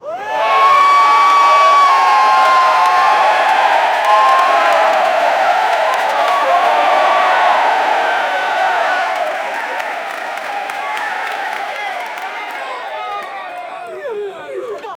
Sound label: energetic crowd cheering, arena